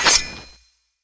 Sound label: sword bombard draw